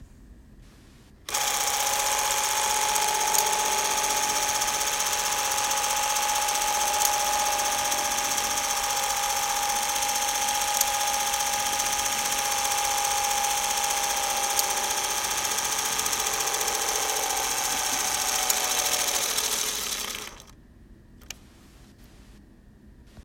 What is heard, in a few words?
Revere Eye-Matic Model CA-2 8mm Audio of Motor